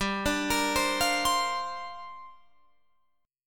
G Minor 11th G down Guitar Open F

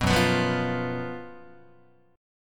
F sharp Major 7th Suspended 4th, Guitar

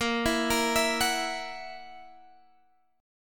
E Suspended 2nd Flat 5th; 18,19,20,21,19,x

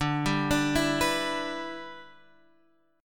E Minor 7th D

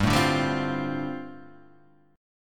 G 7th Suspended 2nd Suspended 4th; G7sus2sus4 strummed

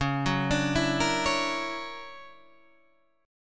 D Augmented Major 9th Db, guitar

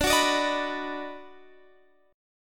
Eb Minor Major 7th Sharp 5th D, chord

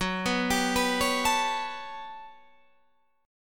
G Major Sharp 11th Gb, chord

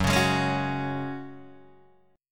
Gb Suspended 2nd, Guitar DADGAD

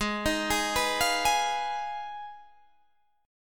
F Minor 7th Flat 5th Ab

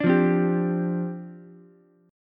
D Minor 11th D down Ukulele C6; Dm11 strummed; JGuitar

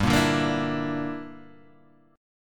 Bb 6th Flat 5th G

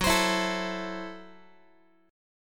Gb Minor 7th Flat 5th, Guitar; strummed; JGuitar

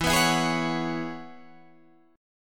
E 6th Add 9th E down Guitar Open D

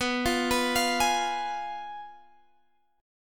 Ab Minor 6th B

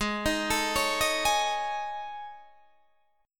A Major 7th Flat 5th

